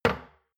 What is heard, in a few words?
shot glass place table medium; berufe; gastronomie; soundpool